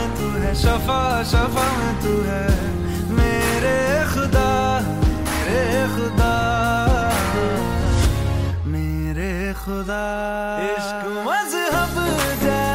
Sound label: bollywood sweet ringtone